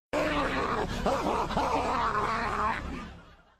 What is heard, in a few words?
funny growling and barking man; vine